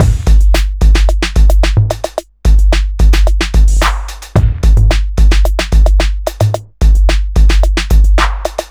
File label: bubble but 110bpm